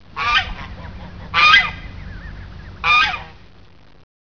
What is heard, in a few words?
seagull